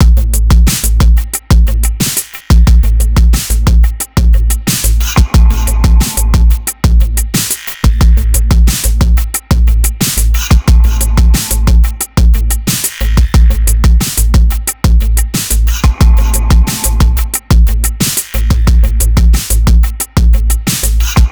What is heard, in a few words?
Groove 90; PS Groove Library2